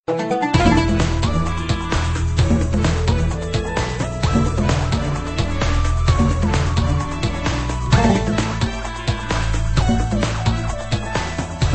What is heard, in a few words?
Victory Sound